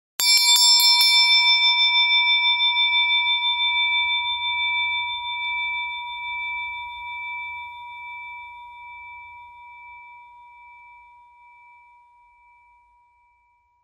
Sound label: vechevoj bell